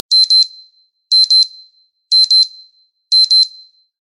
Alarma Beep; alarmas

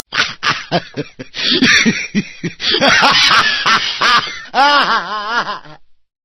Risa malefica; graciosos

